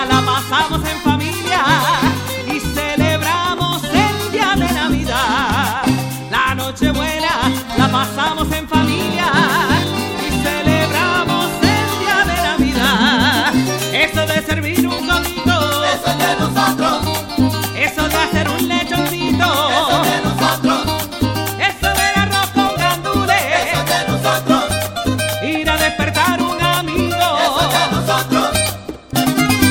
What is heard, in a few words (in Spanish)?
Parrandon